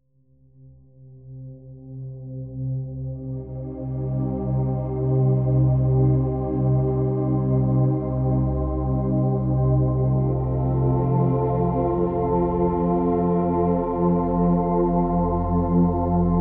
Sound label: binaural sample no beats